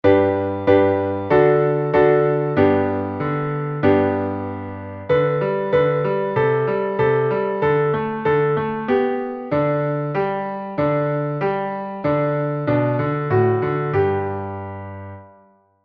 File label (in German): Klavier; Achtung Feuerwehr